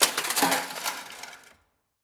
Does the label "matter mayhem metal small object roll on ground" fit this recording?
Yes